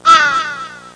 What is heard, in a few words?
crow in a tunnel; birds; Sound Effects for Multimedia - Volume 1